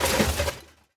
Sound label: metal break